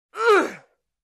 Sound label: Male Grunting